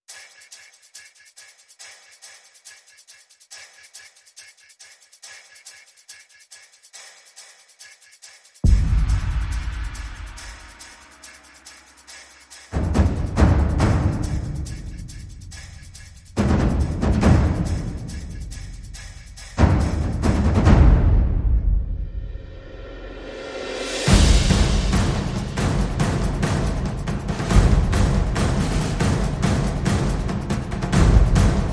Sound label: Perc Only